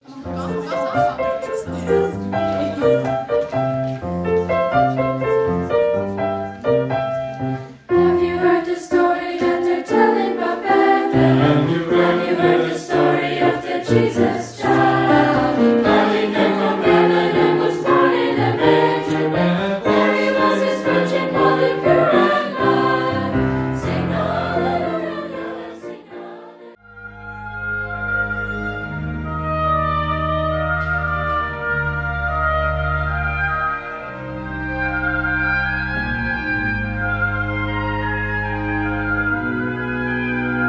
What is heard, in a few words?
Jingle Timeout